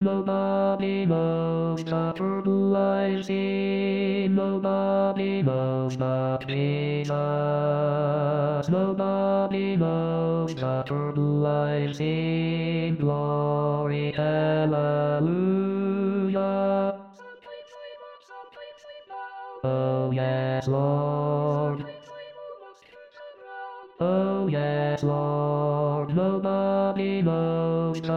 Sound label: Basses Nobody Knows